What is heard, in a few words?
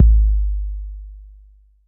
arch, Kicks; Heartbeats